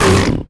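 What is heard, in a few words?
rock damage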